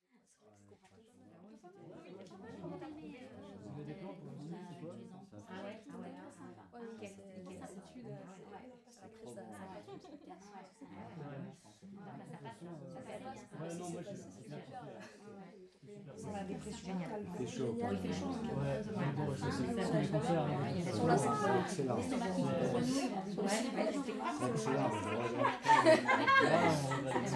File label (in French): PUBLIC PETITE FOULE CONVERSATIONS DISCUSSIONS ANIMEES